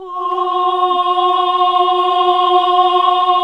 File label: OHH F, Nuns Ooohh; Sound & Vision - Gigapack II